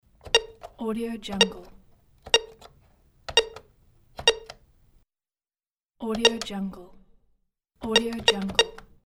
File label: telephone buttons